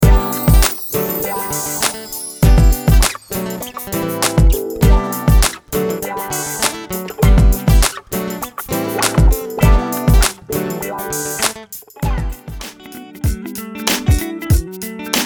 guitars

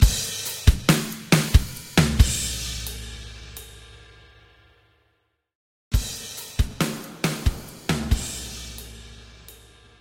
drums hillside

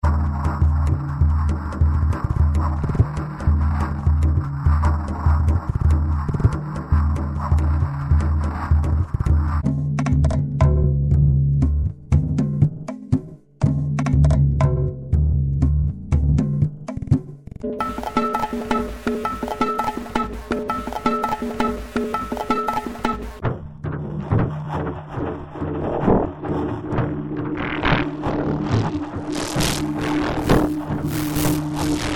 Percussion Experiments

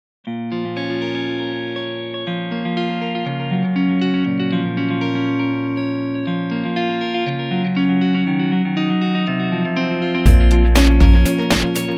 Urban Assault; Electri6ity

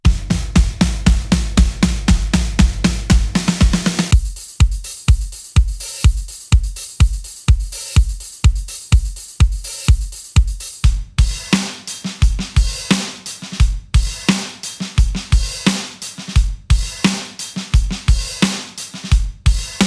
dry

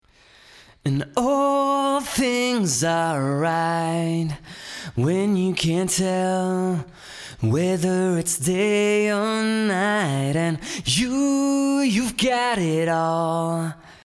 waves

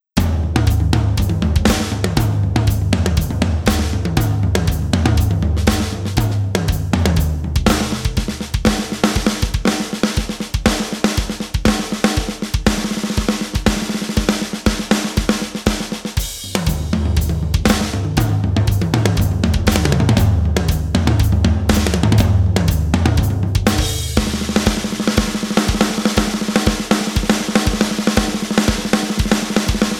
Kit Tom and Snare

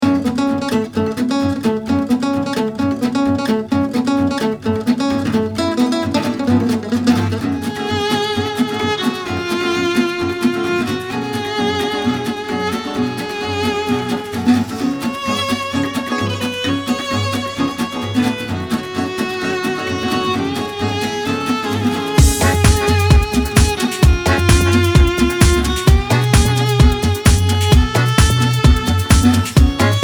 Sad Violin

